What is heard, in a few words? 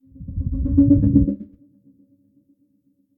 MOLOCH attack